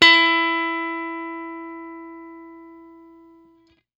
BLUE POP, Guitar & Bass

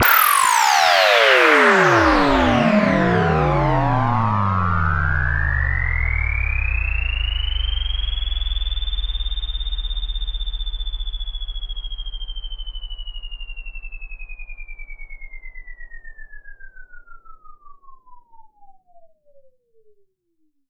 Sweeps Down

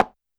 Conga H Mute; YAMAHA RM1X

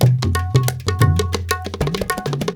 India, Heart of Asia

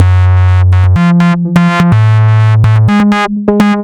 Distorted Phunk, Bass; Sonic Foundry (Sony Creative Software); Underground UK House & Electro

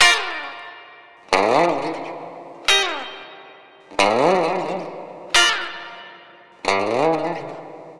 Lounge Gtr-A#; Zero-G Kocktail Kollection; Construction Kitz (Lounge); Welk Lounge 090

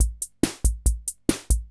140 POPS

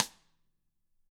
CAMCO SNR; ILIO; Double Platinum Drums 1

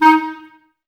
clarinets, Wood Winds

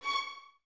Violins C#6, String Ensembles; 23 Violins; Miroslav Vitous; LINKS ORCH

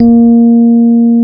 A#2, Electric Pianos; Wizoo

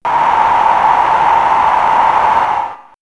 Cosmic Breeze, sci fi synth fx; X-Static Goldmine

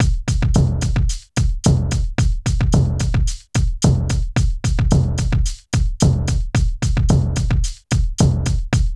HIPHOP; INIS; Funky Xtreams

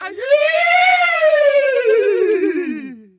Male Voice, African Voices; Quazibeat; African Secret; Male Vocal

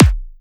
Electro Kicks

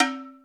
Unbottled, Drum Machines Alesis D4

